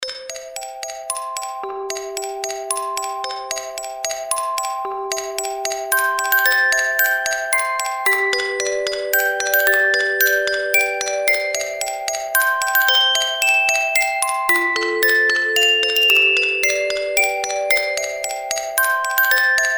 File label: Happy Birthday to you Music Box Version